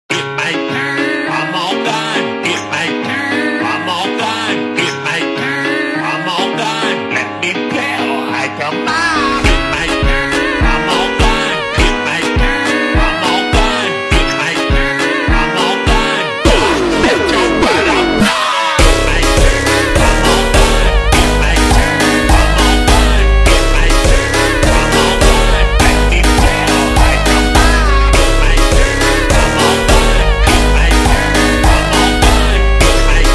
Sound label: Jumpstyle ultra Slowed